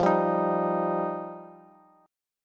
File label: F 7th Suspended 2nd, Bass; strummed; chord; F7sus2; JGuitar